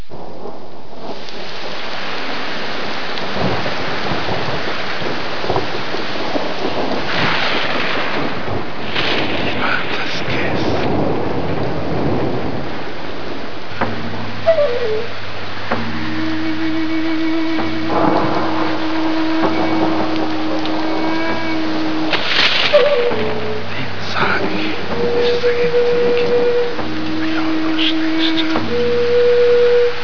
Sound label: storm